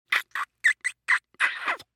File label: KISS complex extended amorous squealing smooch